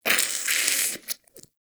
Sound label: Wheeze Close; Ambience; Warframe; Infested Eris